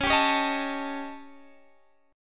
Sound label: A 7th Flat 5th Db